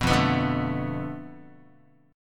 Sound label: F 6th Flat 5th F down Guitar, chord; F6b5 strummed; 2,1,2,0,1,x